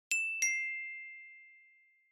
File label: Glocken Phrase